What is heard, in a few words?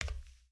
Foot Steel Boot Walk, footsteps